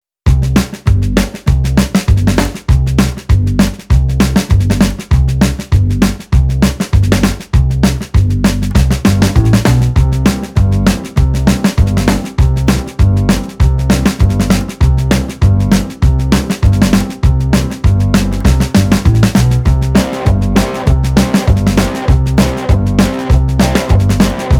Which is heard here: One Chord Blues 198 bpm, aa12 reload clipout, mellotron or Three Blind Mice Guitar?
One Chord Blues 198 bpm